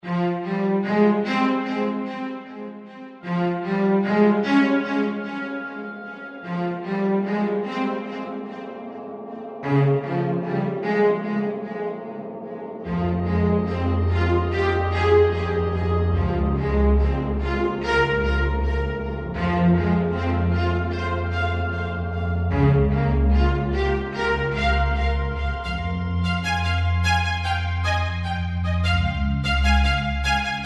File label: new level; Hack Slash Loot; Android